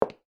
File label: Concrete Heels Walk; コンクリート ヒール 歩行音